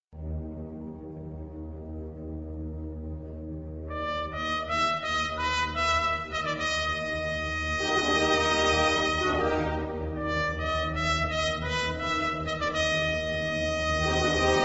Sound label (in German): Konzert fuer Trompete